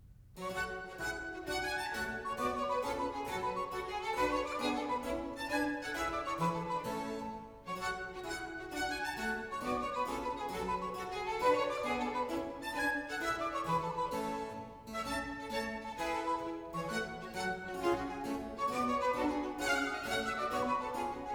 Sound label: Suite in G Major Gigue; Gigue II; Gigue da capo; Haendel; Water Music